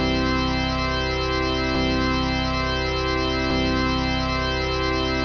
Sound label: F-Sharp Chord